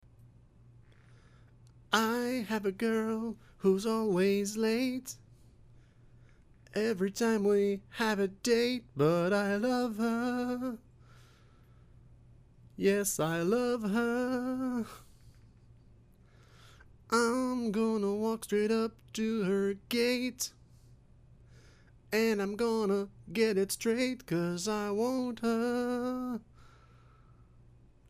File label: near pc preamp low